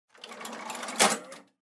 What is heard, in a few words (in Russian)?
Звук инкассатор открыл отсек денег у банкомата